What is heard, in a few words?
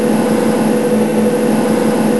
hi mach, amb machine